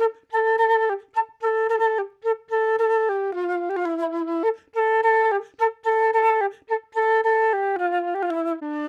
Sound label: Flute Loop - 108 BPM D Min, Dry; Melodics; Instrument Loops; Flutes; Cymatics; Cobra Hip Hop Sample Pack